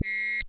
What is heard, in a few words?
COMPUTA TONE; Zero-G Ambient 1; COMPUTACHATA